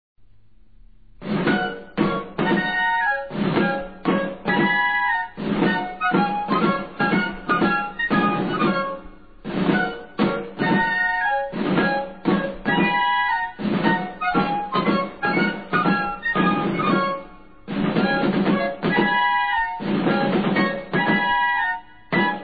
Retreta; 1769; ORDENANZAS CARLOS III; TOQUES DE CARLOS III

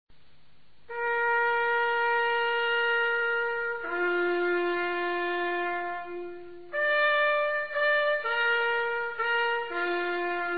Oracion, TOQUES DE CORNETA

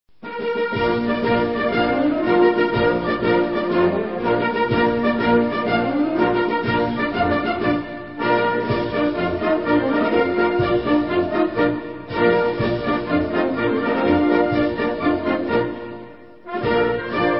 Relevo, TOQUES DE CORNETA

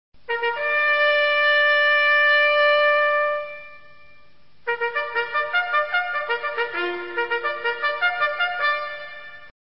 Fagina, TOQUES DE CORNETA

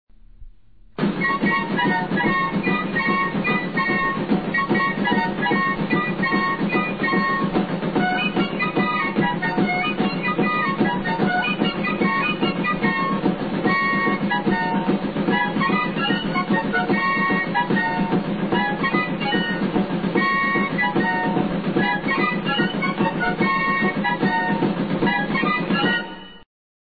Ataque o Carga; ORDENANZAS CARLOS III; TOQUES DE CARLOS III

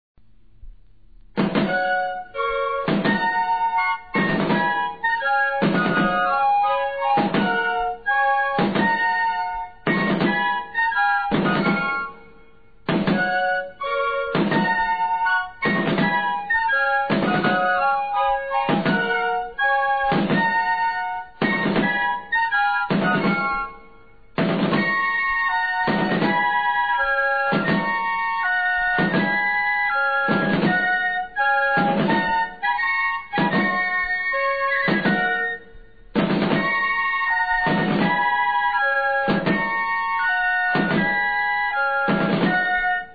Marcha de Granaderos; 1769; ORDENANZAS CARLOS III; TOQUES DE CARLOS III

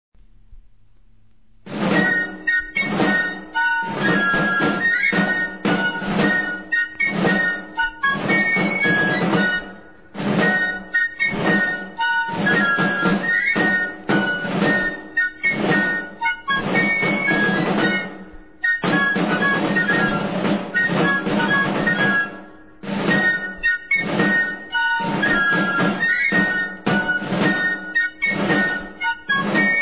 Llamada; ESPINOSA; 1761; TOQUES DE CARLOS III

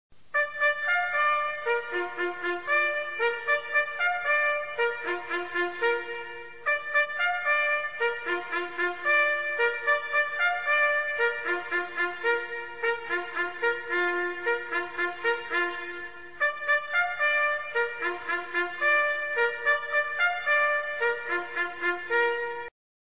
Marcha de Infantes, TOQUES DE CORNETA

